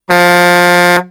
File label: garbage truck horn; gmod; sligwolf